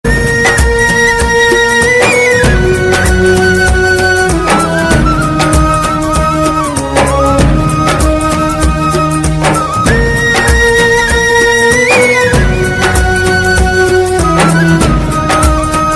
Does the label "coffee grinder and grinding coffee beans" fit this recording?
No